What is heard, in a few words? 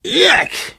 reaction disgusted, biker, voice